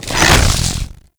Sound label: Infested Ancients Melee Attack; Warframe; Characters